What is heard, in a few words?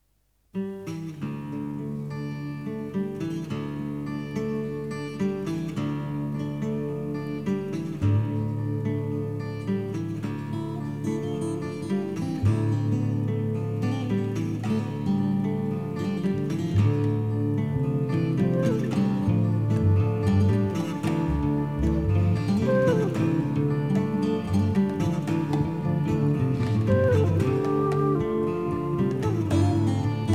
Improvisation